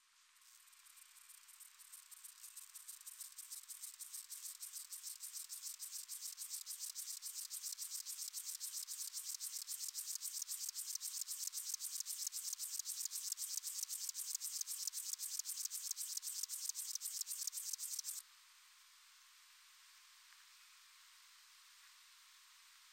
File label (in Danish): solgræshoppe